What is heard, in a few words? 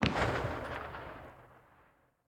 bullethit far; War Thunder